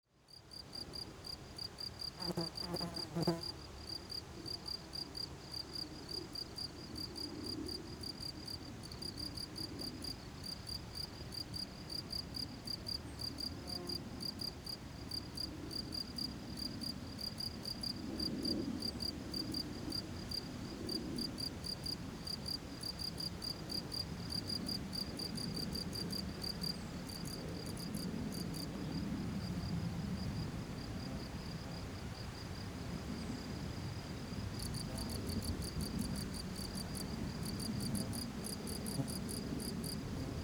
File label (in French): Prairie grillon solo